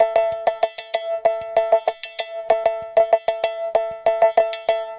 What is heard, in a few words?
192 filtered synthetic cowbell